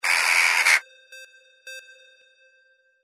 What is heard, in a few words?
CROW